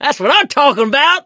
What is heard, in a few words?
positive noise, mechanic, survivor, voice; source engine; left 4 dead 2